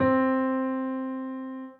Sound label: soft hit whistle; osu!